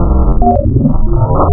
KORG VS, wavetables, Classic Synths

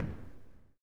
STOMPER; Spectrasonics Vocal Planet

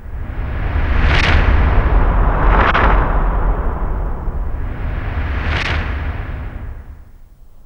SURF

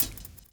drum chop; AMG Gota Yashiki Groove Activator

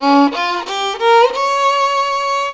VIOLON B, Indian Traditions; USB Soundscan vol.45; AKAI

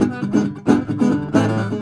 Blues Guitar Samples; ILIO; Fretworks; SIMMS RIFF

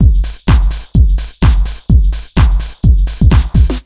127 BEAT, Fresh Disco House; USB Soundscan vol.20 - Fresh Disco House I

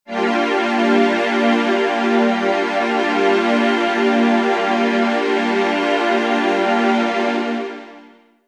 Digi Pads; Jungle Frenzy 1

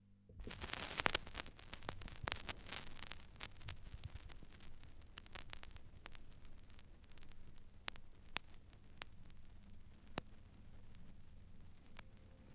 Turntablism; Sonokinetic - The Roots Drumloops

